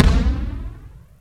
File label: BOOM, Elec. Percussion; E-MU Producer Series Vol. 2; More Studio Essentials; Composer